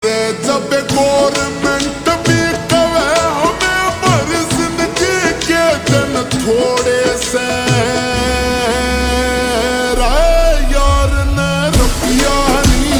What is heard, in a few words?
Ringtone slowed and reverb